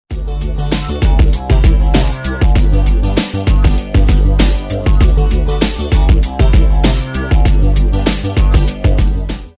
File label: teen pop; Oxford Word Skills Intermediate